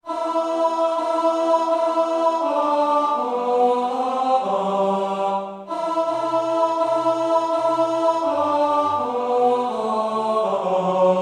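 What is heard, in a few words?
Tenor, choir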